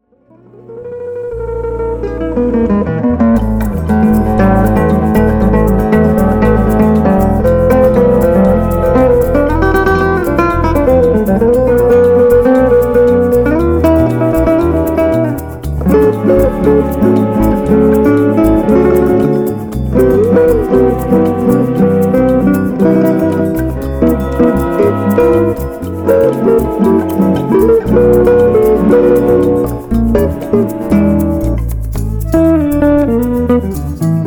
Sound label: Samba, one man band